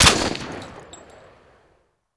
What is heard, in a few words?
asval fire, weapons